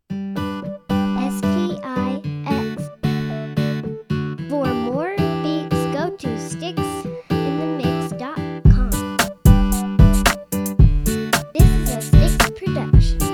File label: happy guitar